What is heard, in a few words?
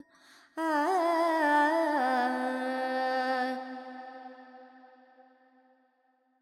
Carnatic Female Alaps, Behag, 128 C, FX; Vocals; Sample Banks